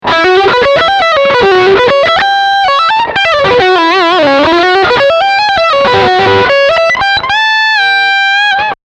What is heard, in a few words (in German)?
Leadline Steg Humbucker